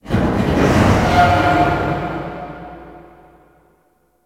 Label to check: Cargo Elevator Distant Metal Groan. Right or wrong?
Right